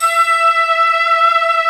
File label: SYNTH PAD